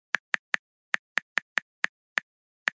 Mobile phone texting

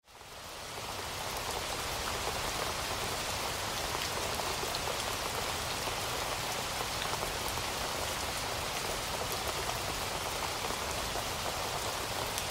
Rain in a rainforest ambience

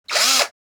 Electric drill quick drilling short

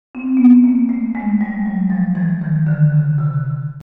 Old vintage cartoon dizzy marimba

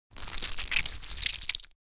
Cartoon accent slime squelching and gurgling

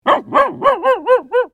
Medium sized dog bark

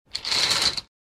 Bed curtain slide squeaky rail close; blinds

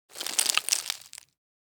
Falling dirt debris dust dropping on wood

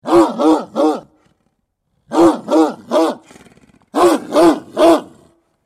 Sheepdog barking repeatedly barks

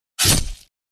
Sword swing swipe hit body

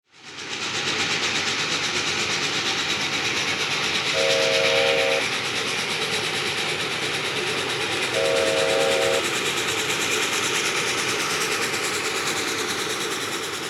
Toy train movement whistle blow; rc toys

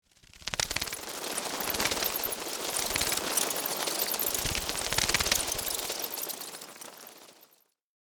Bat wings flapping flight squeak

